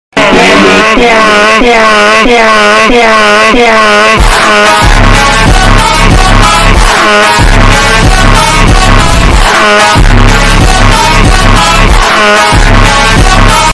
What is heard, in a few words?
troll face music